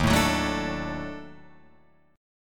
D sharp Minor Major 7th Flat 5th D sharp down Guitar Open F; strummed; chord